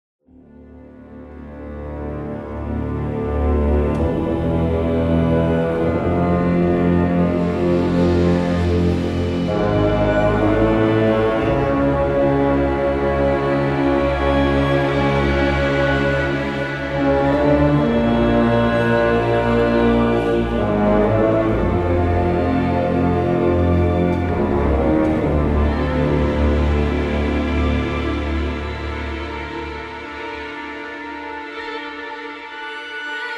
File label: Distorted; Vol'dun; Battle for Azeroth